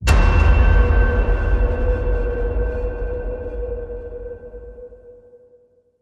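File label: hit horror vesper bell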